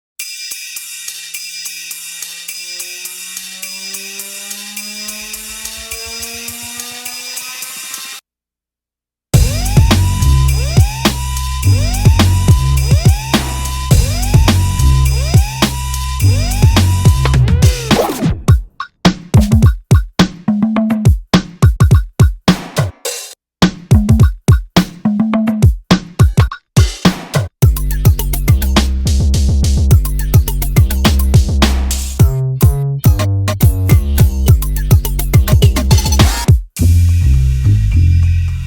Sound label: Instrumental